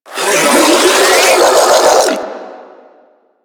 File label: Spin Scream; Warframe; Proto Infested Ancient; Abilities